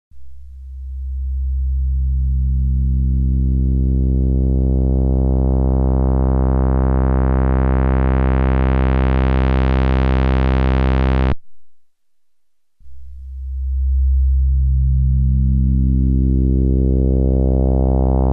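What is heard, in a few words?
CHARACTER SWEEP LIQUID; Redshift 6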